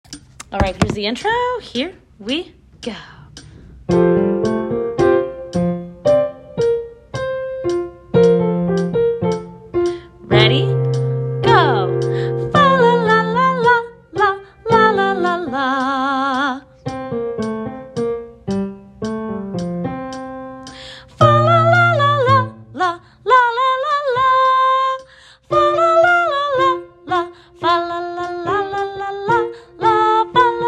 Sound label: soprano